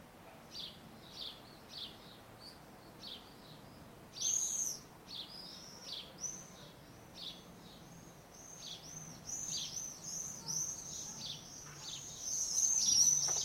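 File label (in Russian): трели птиц в ночном лесу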